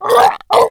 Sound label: death, infected; szf